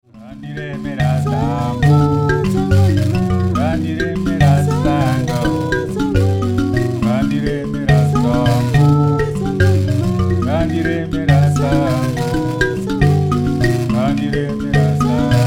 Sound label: mbira